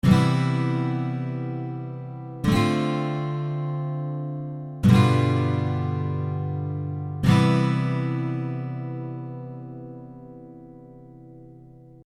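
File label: Guitar CDGC 7th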